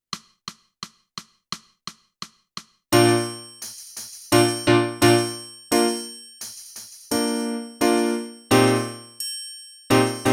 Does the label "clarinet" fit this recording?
Yes